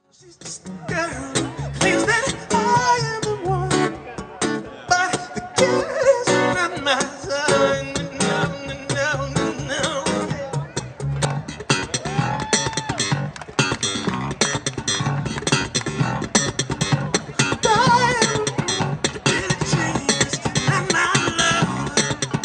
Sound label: loop pedal malfuction